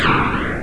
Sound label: duct dump